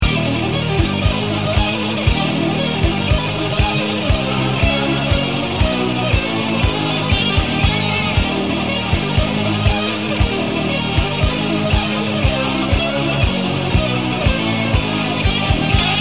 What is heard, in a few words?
sweep